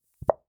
Plunger Pulled Of Cement Floor, Household

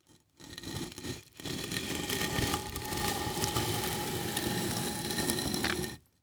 Impact Brick Drag Through Rock Salt Slow

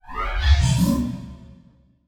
Multimedia Internet CD-Rom Flash Animation

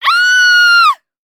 Horror Scary Human Female Screaming

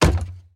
Impact Hand Hit Wood Door Single; Impacts

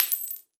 Crash Bulb Break; Crashes

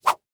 Foley Stick Bamboo Stick Skinny Whipping

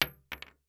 Weapon Bullet Shell 3030 Drop On Hollow Wood; AdobeCC

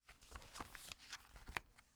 Household Magazine Opening

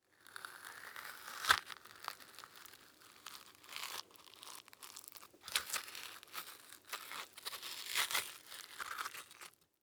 Horror Scary Skin Peeling Off Slowly

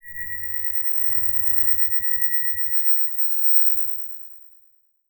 Title Transition Crystal Eerie; Adobe; Production Element

